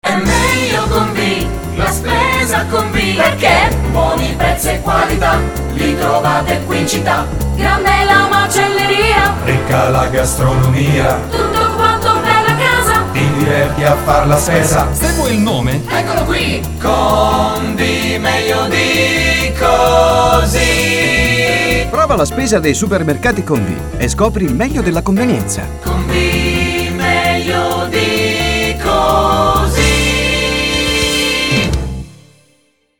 swing

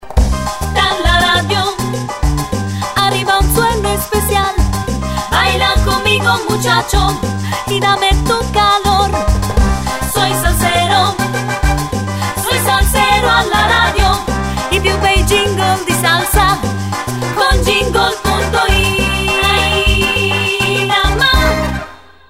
radio soysalsero; Soysalsero JINGLE cut2

